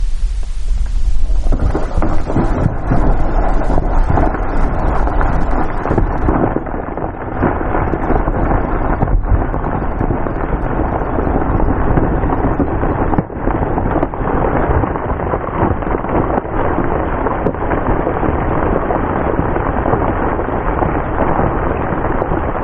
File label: Rock Slide on Yentna Glacier; alaska acoustic highlights; GEOPHONY